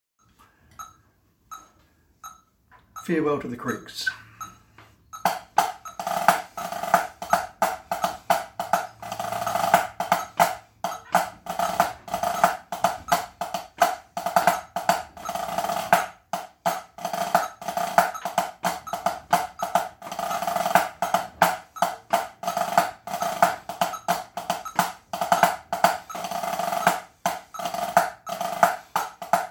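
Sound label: Farewell to the Creeks, drum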